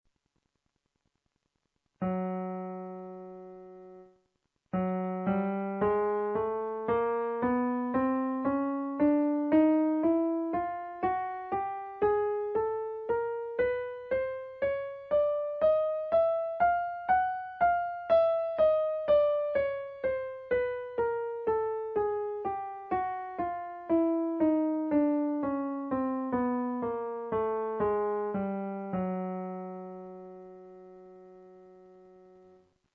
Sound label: chromatic, musical scale